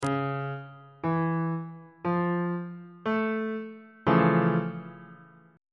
e sharp augmented major 7th 2nd inversion on bass clef